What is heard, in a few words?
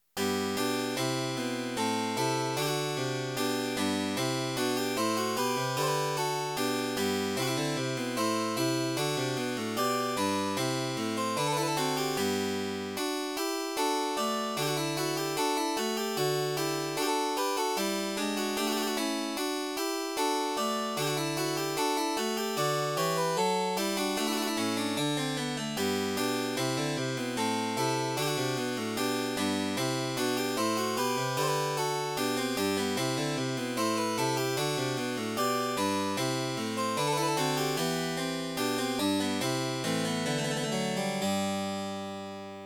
Gavotte